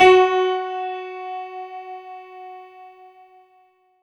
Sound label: F#4, Synth Line; 90 s Sample CDs; NiceBeats; SynthLine VOL-23